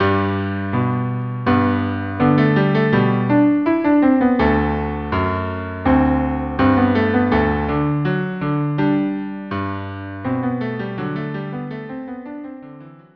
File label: cello duo andante; KV 487